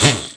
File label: Buzz